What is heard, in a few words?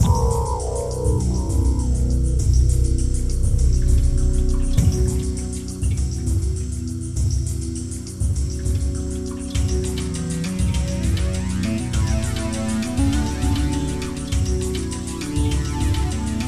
Music